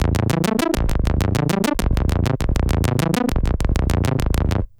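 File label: SYNTH, TIMBA, Hip-Hop & R&B; USB Soundscan vol.47; AKAI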